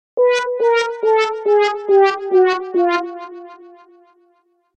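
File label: quiz sounds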